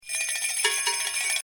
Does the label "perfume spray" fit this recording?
No